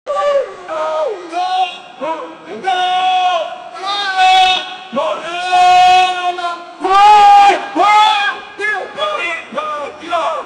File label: japanese samurai shout; battle-cry